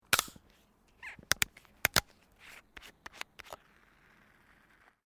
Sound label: Vending Machine Softdrink Screwtop Open; Japanese vending machine; Machinery; SoundEffects-Foundation4